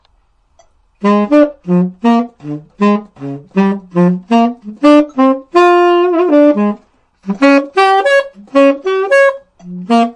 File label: 4th Interval Blues; McCaslin